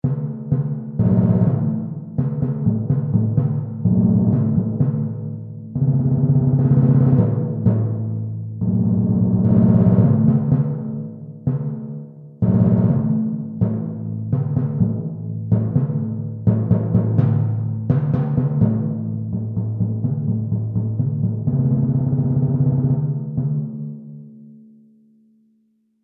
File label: timpani set